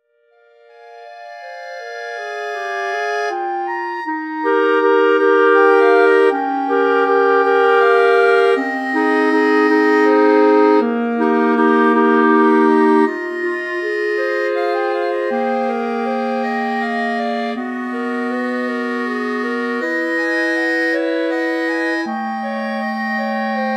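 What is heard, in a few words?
Amazing Grace Clarinet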